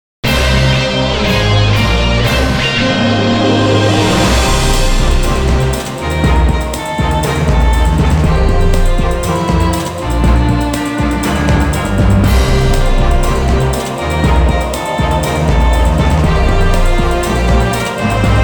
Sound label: Victory Fanfare; Chaos; DISSIDIA FINAL FANTASY; Dissidia -Final Fantasy- Original Soundtrack